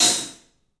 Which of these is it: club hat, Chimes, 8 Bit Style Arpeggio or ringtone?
club hat